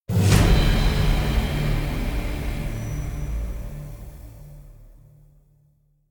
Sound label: String; Assassin's Creed Unity Dead Kings